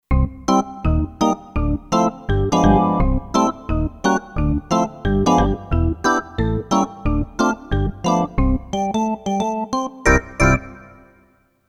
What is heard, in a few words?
Tamborgan, Kurzweil K2500X; Factory Programs